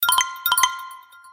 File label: notification; Infinix; A88